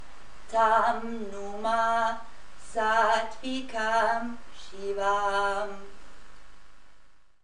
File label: shiva sloka